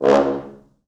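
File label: French Horns staccato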